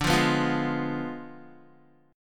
D Diminished 7th D down Guitar; Ddim7 strummed

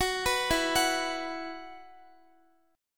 E Suspended 2nd, Guitar; Esus2; arpeggiated; x,x,16,16,16,13

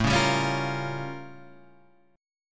A Augmented 7th Flat 9th A; strummed; 1,3,sharp5,b7,b9; JGuitar

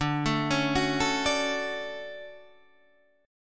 D Major 7th, chord; arpeggiated; JGuitar

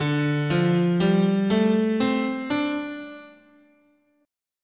D Minor Pentatonic, scale

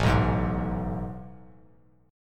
A Major 7th Suspended 2nd, Bass Standard; AM7sus2 strummed; JGuitar

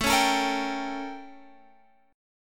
A 7th Flat 9th Guitar Open F; A7b9; strummed

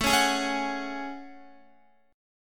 A Major 13th, down Guitar; AM13; strummed; JGuitar

